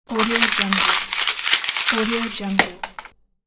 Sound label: paper raping sound